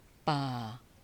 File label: breath forest